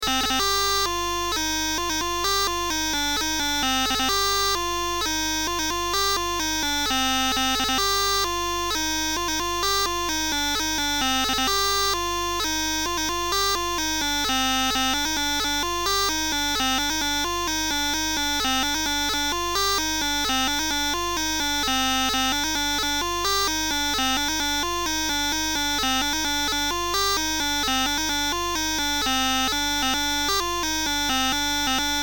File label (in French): Laridee 8 tps